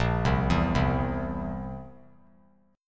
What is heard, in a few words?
Bb Suspended 2nd Flat 5th Bb down; arpeggiated